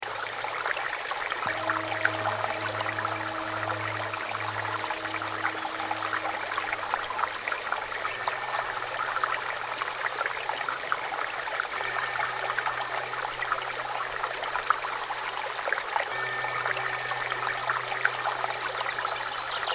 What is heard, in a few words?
Lapped In The Singing Stream, nature sounds